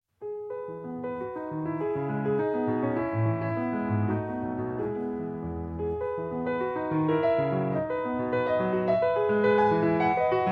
Piano Sonata No. 30 in E Major, I. Vivace, ma non troppo